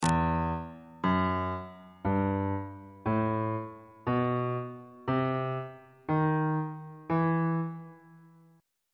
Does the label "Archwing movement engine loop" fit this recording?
No